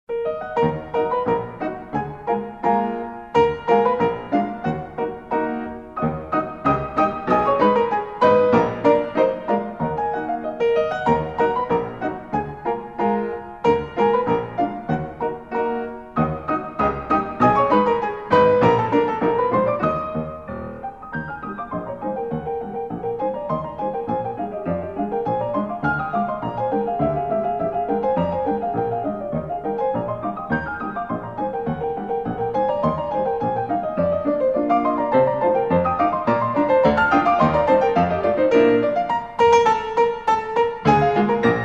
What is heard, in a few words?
Italian Polka for Piano duet; Rachmaninov